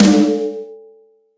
subby snare sound d key; snare drum samples

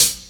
raw pedal hi hat sound c sharp key; one shots; hat samples

